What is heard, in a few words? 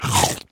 big chomp; soundspvz